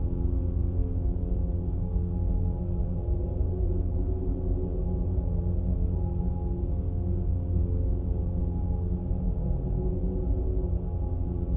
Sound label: H music loop